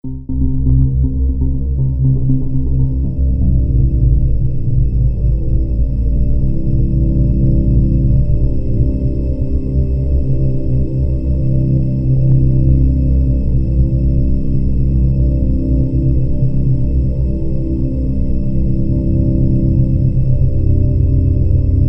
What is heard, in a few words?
Dropocatve Harmonic Drone; Best Of N2O Library; Drones & Foundations